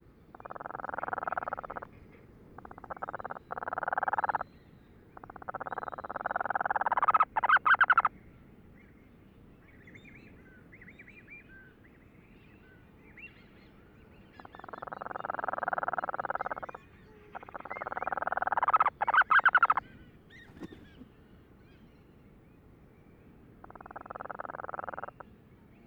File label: Cape Verde Storm Petrel